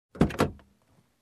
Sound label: Opening Car Door